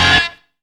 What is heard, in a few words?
GRUNGE STAB; Zero-G; Funky Elements